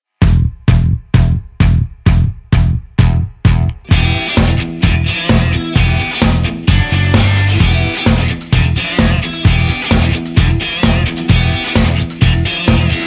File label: west coast rock backing